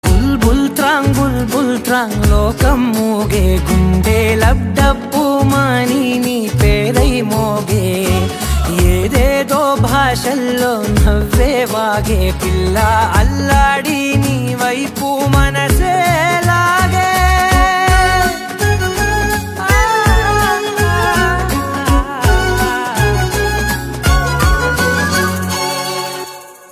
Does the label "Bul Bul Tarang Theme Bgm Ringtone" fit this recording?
Yes